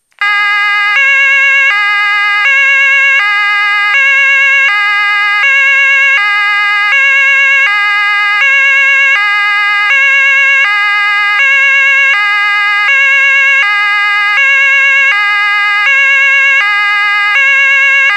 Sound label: Hella Land Signal